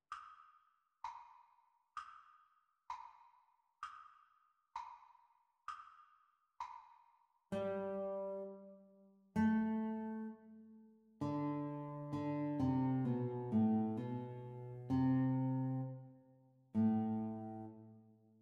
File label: Guitar Duet Playalong Practice Speed